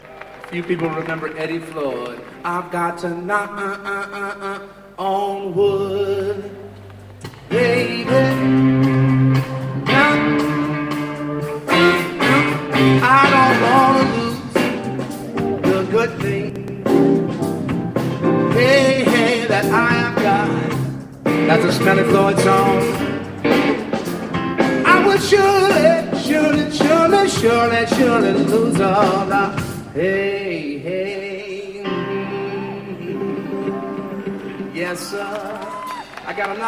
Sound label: knock